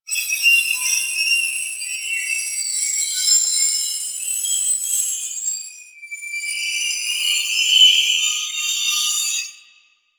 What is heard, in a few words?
Drifter Camp Valley Night Critter; Ambience; One Offs; Warframe; Quests; The New War